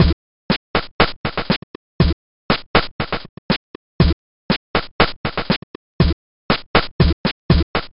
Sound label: Beat; Sidescroller